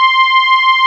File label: PD DARK, Nord, LEAD PAD HRN; Wizoo - Powered Nord